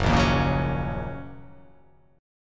Gb 6th Gb down; Gb6 strummed; JGuitar

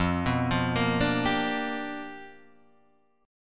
G 7th Sharp 9th F chord

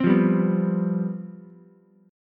C Major 7th Suspended 4th, down, Ukulele

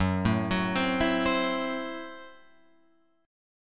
Gb Minor 7th Flat 5th Gb down Guitar Open F; Gbm7b5 arpeggiated; JGuitar

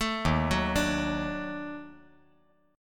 D sharp Minor Major 7th Flat 5th D sharp; arpeggiated

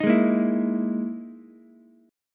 E Major 7th Flat 5th, Ukulele; EM7b5 strummed; 8,8,6,7; JGuitar

